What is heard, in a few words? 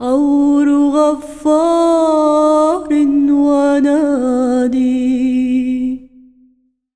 SINGER, ArabianTraditions